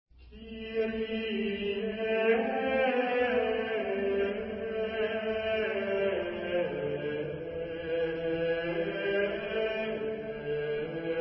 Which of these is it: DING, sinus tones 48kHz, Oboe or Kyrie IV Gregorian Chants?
Kyrie IV Gregorian Chants